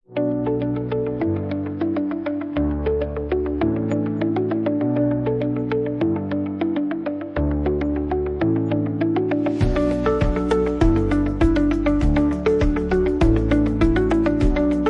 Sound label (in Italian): audio attesa telefonica